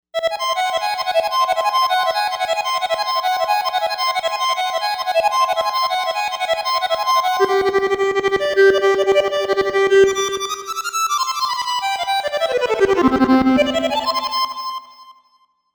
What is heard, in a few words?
Voc Harmonica; Novation UltraNova; Dino-Soundpack